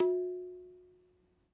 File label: Na, Tabla; Tabla Kit Large